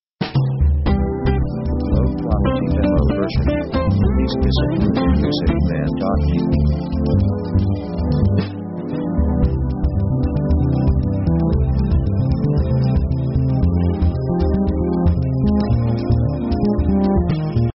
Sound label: pop 114bpm Baiao